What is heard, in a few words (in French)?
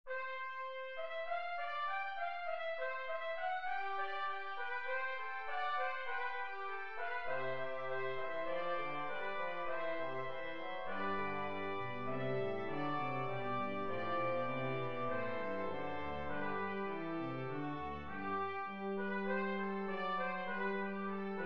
fugue in c pour 2 tompettes 1 trombone et 1 tuba; handel george frideric